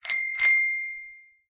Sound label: transport bicycle bell ring